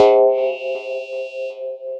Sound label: Carillon, mallets; Dixxon